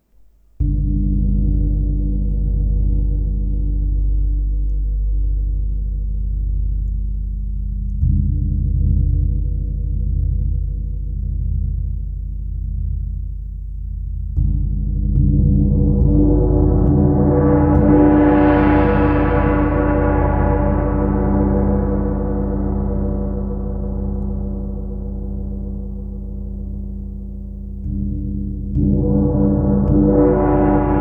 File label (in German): Gong 51cm Durchmesser Ton G 488Hz